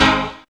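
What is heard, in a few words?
PIANO CHORD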